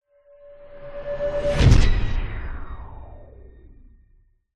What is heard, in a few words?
sound design whoosh sci fi pass hit; zapsplat